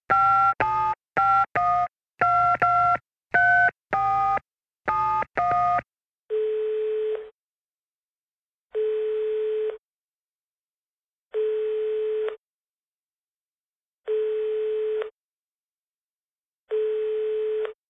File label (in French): téléphone